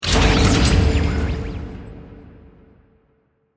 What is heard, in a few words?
SE logo